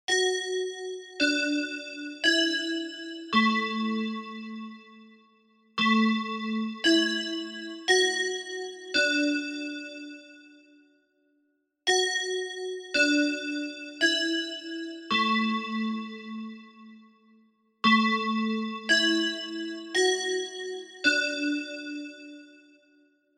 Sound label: Japanese school chime